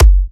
F# kicks; markus hakala kicks

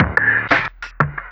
Orbish Funk, Synchro Funk, Beats

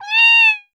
Kitty; Roland BK-5

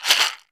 KAYAMBA, AFRICAN PERC; Greytsounds VOL-1

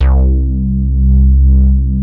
BASS C2; Q Up Arts; Dream Experience

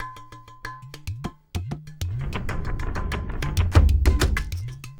Chunk Vase, Drums; Sonic Foundry (Sony Creative Software); Friendly Fire; Marc Steve

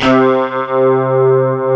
ROCK GUIT C3, ROCGUITAR

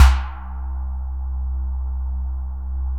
BOUNCE; Masterbits; Vintage Voltage; SQ+FX

